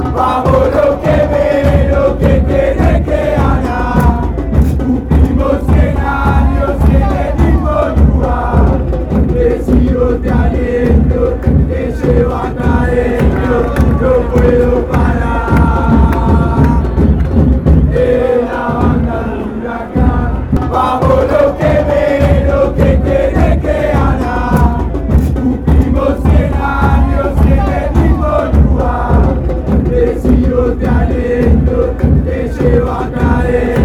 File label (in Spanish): chant hu hu, fanchants; huracan